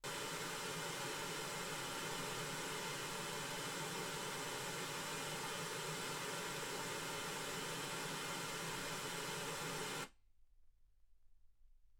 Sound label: wgn 48kHz